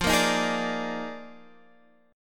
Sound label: D sharp Major 7th Suspended 2nd Suspended 4th F, chord